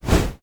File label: shoot fireball; Epic Toon FX